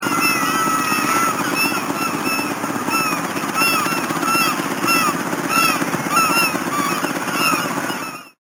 harbor

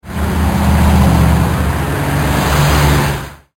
TRAFFIC

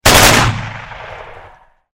machine gun

